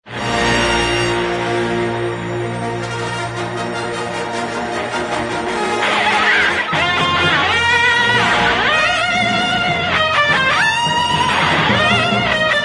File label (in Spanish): guitarra electrica; star wars